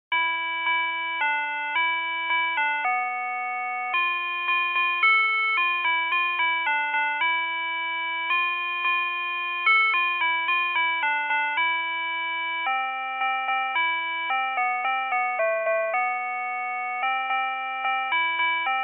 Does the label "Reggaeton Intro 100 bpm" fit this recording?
No